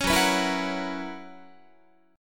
Gb 11th, Guitar

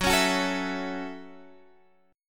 Gb Minor 7th, Guitar Standard; strummed; JGuitar

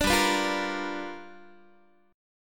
G 7th Sharp 9th G chord, Guitar; G7#9 strummed; 17,15,14,15,14,x

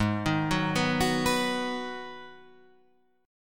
B Major G sharp, B/G# chord; arpeggiated; JGuitar

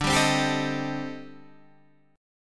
A-Minor 7th Flat 5th D, chord; Am7b5/D strummed; JGuitar

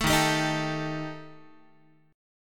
Eb Major Flat 5th Eb Guitar; EbMb5 strummed; JGuitar

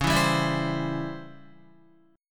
Db Minor Major 7th Flat 5th Db down Guitar; DbmM7b5 strummed; JGuitar

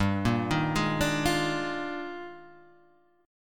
Eb Major 11th G, guitar

